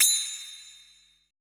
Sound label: HI BELL, PERC; BPC PERC